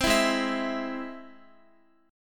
Ab Augmented Ab, Guitar, 4E, 4A, 5D, 5G, 5C, 6F; x,x,10,9,8,11; Ab+ strummed

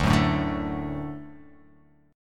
D Major D down, D Chord; D strummed; JGuitar

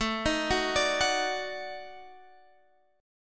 D sharp Minor Major 7th Flat 5th, Guitar

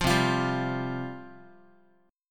C Suspended 4th C Guitar; strummed; 8,8,8,5,x,x; JGuitar

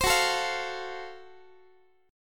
Gb Minor Major 7th Flat 5th, Guitar, chord; strummed

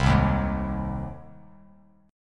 C Minor Sharp 5th C down Bass Standard

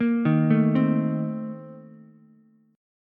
Bb Major 7th Suspended 2nd Suspended 4th, Ukulele; BbM7sus2sus4 arpeggiated; JGuitar

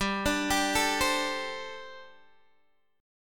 G Suspended 2nd Suspended 4th, Guitar DADGAD

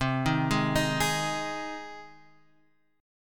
C Minor Sharp 5th C down Guitar Half Step Down

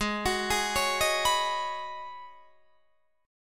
Ab Minor 11th, Ab, Guitar, DADGAD

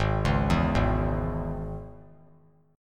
G sharp Suspended 4th Sharp 5th G sharp; G#sus4#5; arpeggiated; JGuitar

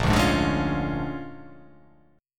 A sharp 7th Sharp 9th

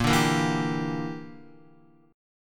A sharp 6th Flat 5th chord, Guitar; A#6b5 strummed; JGuitar

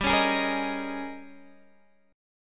Ab Major 7th Suspended 2nd Suspended 4th G

